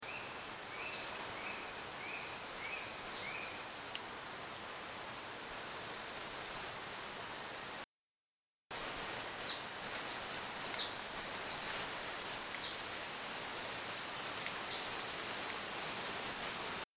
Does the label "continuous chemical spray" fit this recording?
No